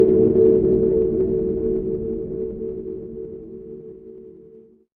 magic scifi alien

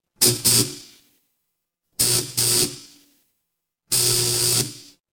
fire electric zap

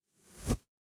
transition whoosh

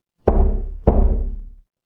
door knock

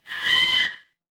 mechanical tire screech

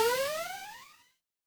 retro game power up

